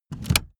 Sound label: car seat slide forward short